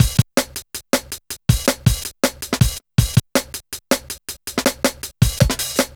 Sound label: 161, breaks, Loops; M8